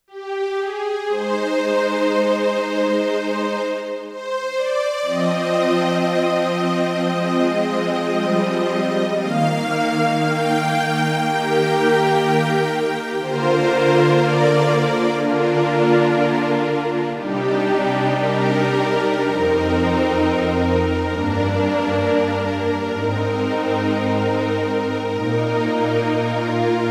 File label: PSR-S670 Strings